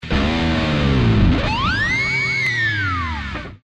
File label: dive bombing, guitare harmoniques